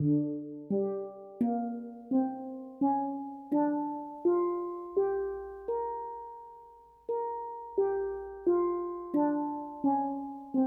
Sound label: D Blues